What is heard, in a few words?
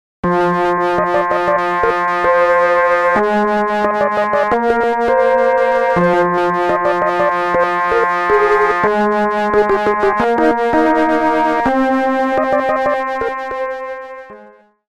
synth; OP-1; Dsynth